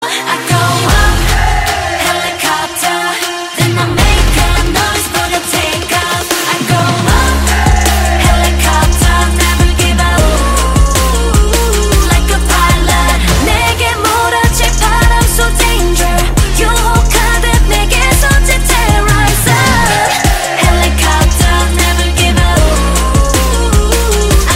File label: Helicopter